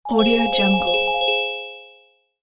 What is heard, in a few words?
bonus game